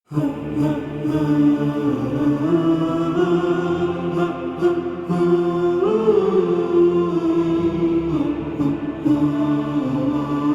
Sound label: Hub Ambient Cue; Kith Of Kings